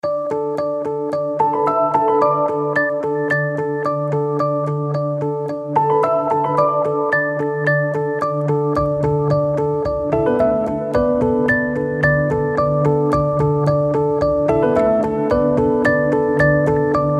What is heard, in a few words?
PIANO EXTENDED